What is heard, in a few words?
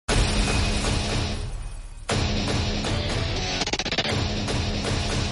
theme music ringtone; race 2